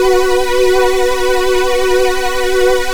SWING B2, LEAD SYNTH; Best Service Alpha Dance vol.2

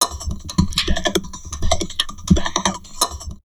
TALK 160, Drum Loops Crazy Processed; USB Soundscan vol.07; AKAI

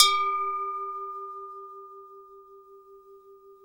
GLOCKE, GLOCKEN; SoundBytes - Early Patches

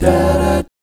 EB13, GUY CHORDS, Vocal, Jazz; ILIO; Vocal Planet VOL-3; Jazz & FX

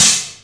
HH OPEN; USB Soundscan vol.27; Ragga & Dub Reggae; AKAI

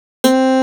GUITAR 130 BPM delay; SONIC ELEMNT; SONIC EL YES

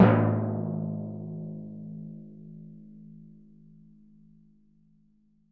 TMP A#, Synclavier Percussion

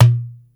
tabla small dom, Yamaha Motif ES drum machine; 300 Drum Machines; Yamaha Motif ES-Series

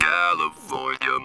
TB CALI, Talk Box

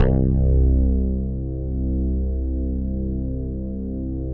HYBRID BASS C; Greytsounds; Scott Peers Sound Engineering

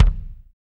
BD, DR BD; Best Service Gigapack 2

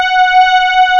MEMOOG STRN, Analog Odyssey; E-MU Formula 4000 Series Vol. 3

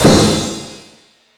Sluggo Drums, Drum Machines

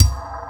BD, Industrial; USB Soundscan vol.24; AKAI

